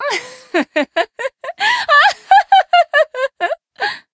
laugh; MissFortune; jp

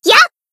Battle Shout; Zunko Newyear

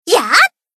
Battle Shout; Serika

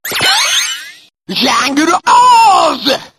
jungle ooo

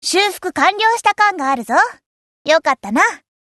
Tone

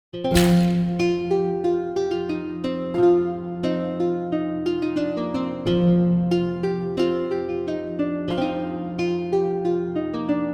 BGM spanish

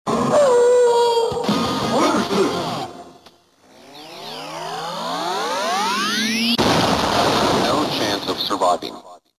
Wolf

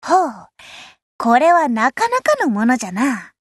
Tone

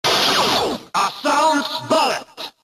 Assault Bullet

